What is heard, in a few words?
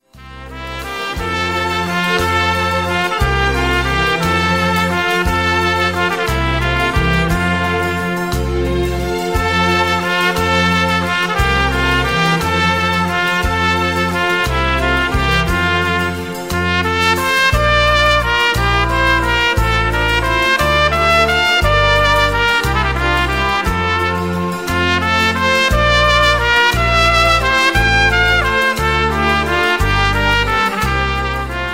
trompet